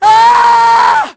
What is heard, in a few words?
dead wom, female; voices; Pirates Of The Caribbean